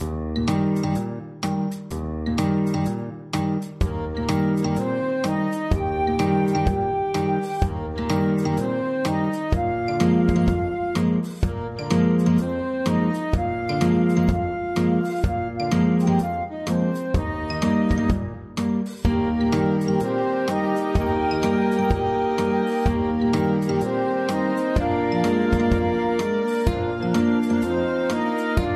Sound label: BAND